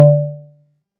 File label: doong; buzz